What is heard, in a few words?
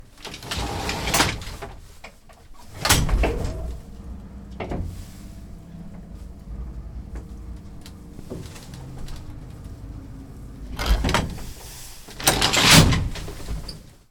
E door